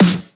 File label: Claps & Snares